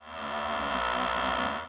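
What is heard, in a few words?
Windmill Creak Reverse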